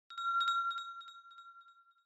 Smartphone UI Sound Dry

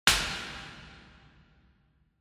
Hit Slap, Reverb; 打撃 平手打ち04-5(リバーブ)

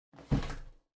Cardboard Box Far Put Down; ダンボール; 遠い 置く; 梱包材

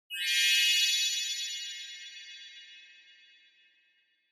Multi Accent Long

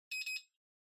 Ceiling Light Beep

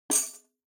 Tambourine Open

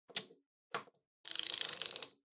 Soroban Reset

